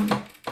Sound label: Chair Wood